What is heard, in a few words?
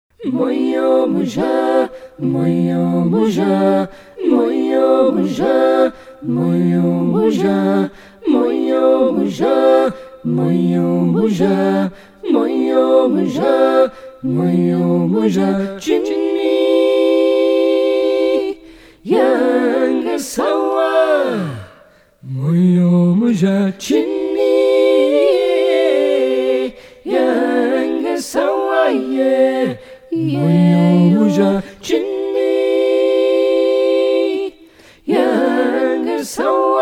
whole vox